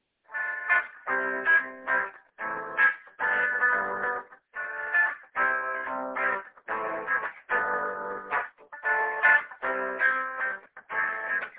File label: simple chord pattern